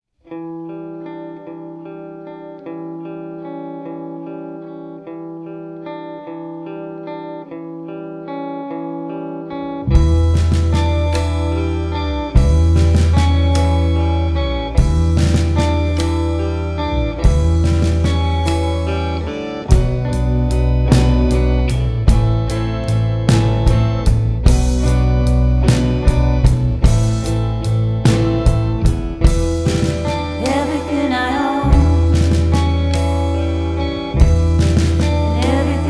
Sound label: Slow Ballad